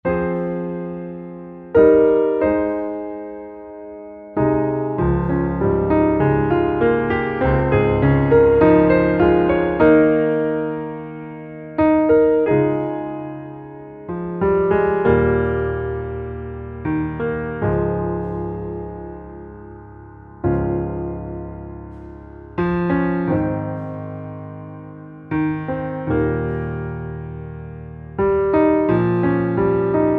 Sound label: Underscore PIANO; Worship Flow